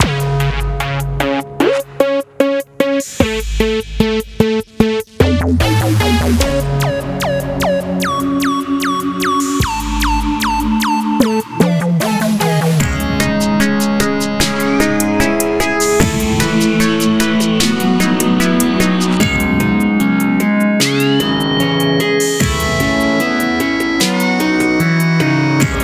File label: strange sanctuary